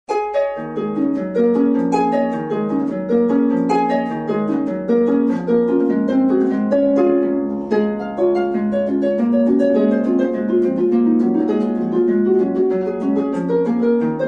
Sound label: harp